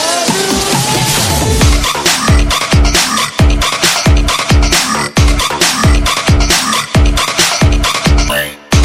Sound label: Cello